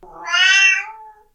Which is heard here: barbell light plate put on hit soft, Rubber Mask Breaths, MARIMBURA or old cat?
old cat